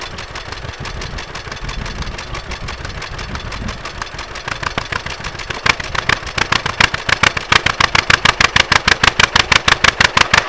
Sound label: work, tractors; poland